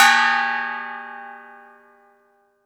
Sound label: korean per; AKAI S-Series CD-ROM Sound Library VOL-5